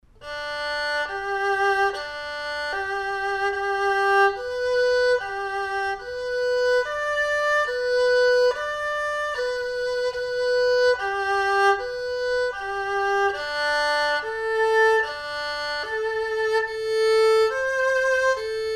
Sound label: erhu